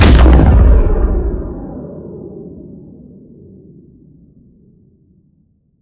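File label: Sci weapon Shot Large Gun Titan Cannon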